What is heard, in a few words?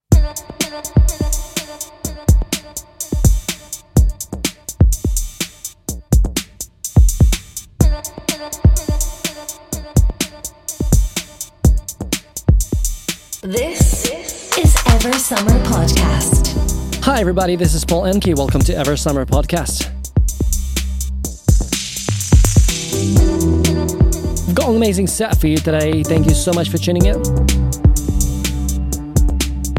melodic house techno electronica; eversummer podcast